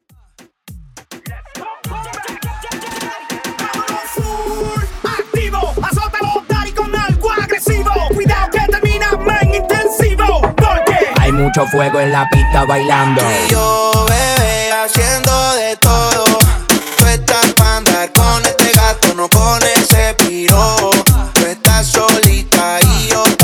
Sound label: Reggaeton Party Intros 103 BPM